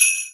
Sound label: xmas sleigh bells